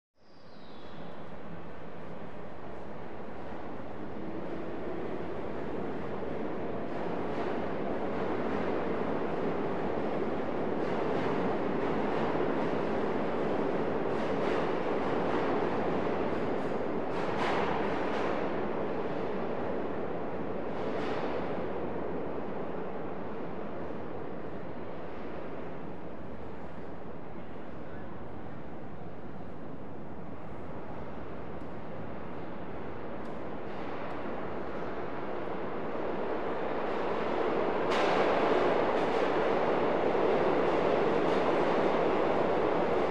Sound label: Subway Platform Twol, subway